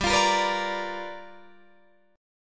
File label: G 7th Sharp 9th G chord, Guitar Open D